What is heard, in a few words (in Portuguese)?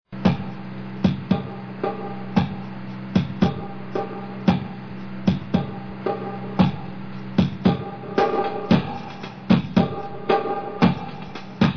percussao